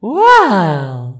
twirl bounce; rosalina; SM64 CO-OP

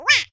ground pound wah; yoshi; SM64 CO-OP

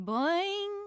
twirl bounce; pauline; SM64 CO-OP

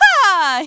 "yahoo"; pauline; SM64 CO-OP

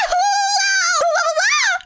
on fire; birdo; SM64 CO-OP

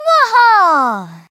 Waha; Teto; SM64 CO-OP; Triple Baka Pack

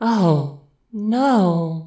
dying; rosalina; SM64 CO-OP

